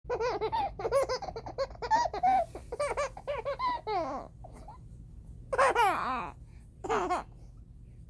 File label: Baby chuckle